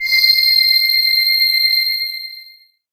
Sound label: synth c, Ambient Atmos; Dance Synths